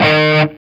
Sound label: E 4, Soldano rock guitar; Spectrasonics; Hans Zimmer Guitars VOL-1